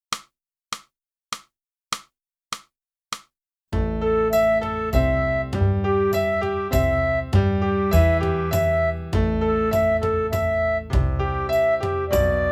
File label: flute; boosey